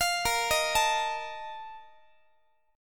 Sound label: Bb Major 7th Suspended 4th, Guitar; arpeggiated; x,x,20,20,19,20; 4A,4E,5A,5D,5G#,6C#; BbM7sus4